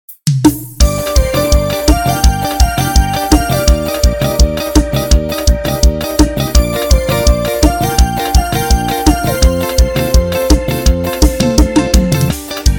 eletrico playback karaoke

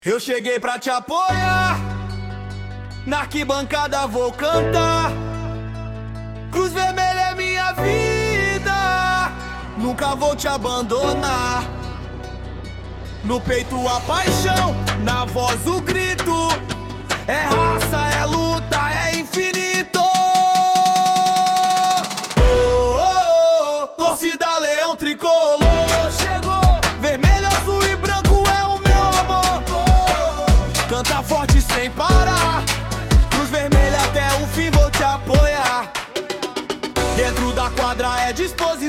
torcida; Leão Tricolor; Leão Tricolor Chegou

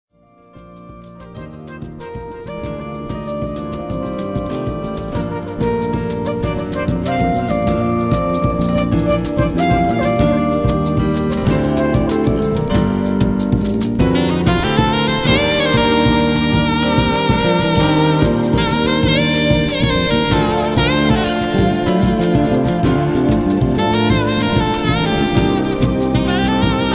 sax alto solo

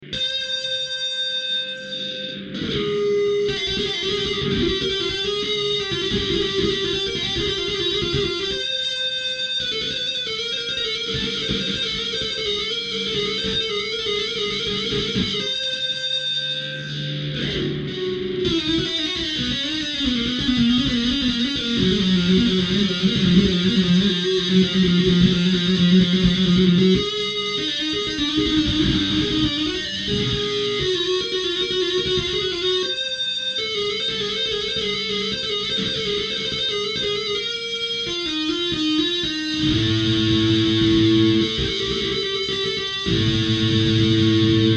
no contrabaixo tambem se usa distorcao

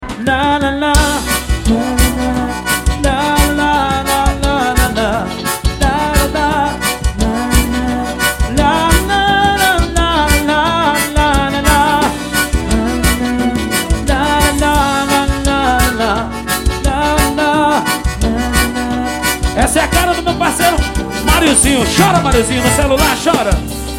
Forró; Manaus AM

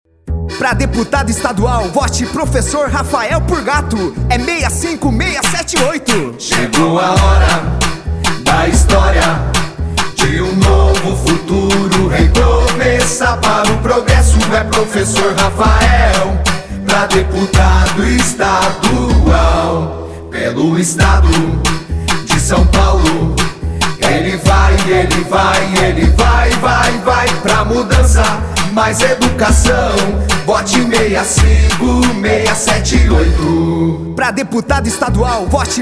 jingle politico

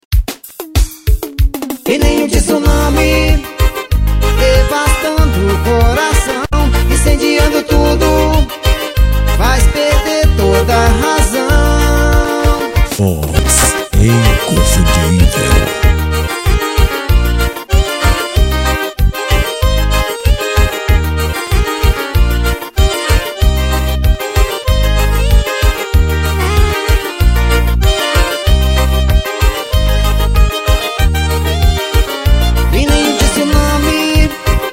forro

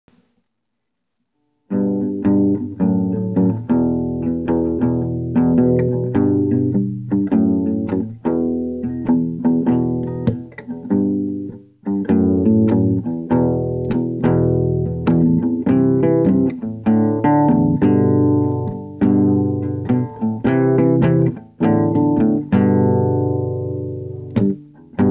violao acustico

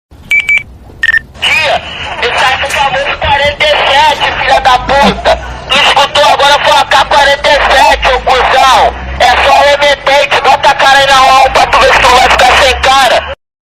toque para celular

